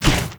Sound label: tiger target struck; mir2; crystal